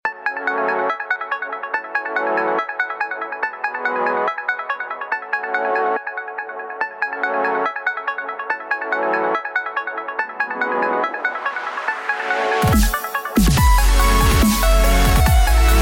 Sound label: Ring